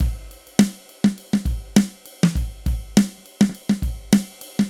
Wooden Kit, V Drum; Sonic Foundry (Sony Creative Software); Electronic Point Blank; Drums & Percussion

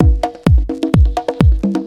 Latin Loop 128 BPM

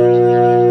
B3 C1, ORGAN; VOCAL ORGAN

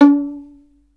STR VLN, Solo Instruments; Miroslav Vitous

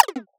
Zip Boing, cartoon; Classic SFX; musicradar; cartoon caper

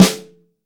BRASS SNARE; BRASS SFT1

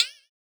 Fx Vox; Equipped Music; Loungin House

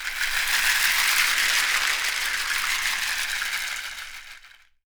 African shaker; Voice Crystal - Steve Reid Definitive Percussion

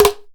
EMELAKO HITS; Best Service; World Colours

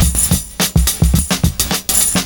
70s Breakbeats; USB Soundscan vol.46; AKAI

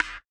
BUZZER; Spectrasonics; Metamorphosis

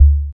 Tomm, One Shots; Sonic Foundry (Sony Creative Software) - Future Retro Dance Excursions

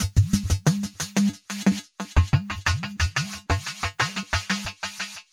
NRG Percussion, Trance Drums; Sonic Foundry (Sony Creative Software); Trance NRG VOL-1

